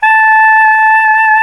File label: SAX SOP SAX, Soprano Long